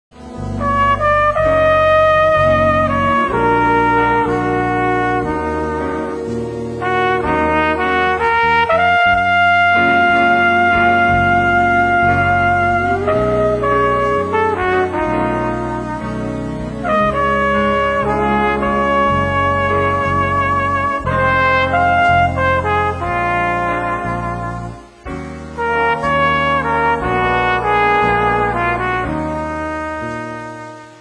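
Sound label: flugelhorn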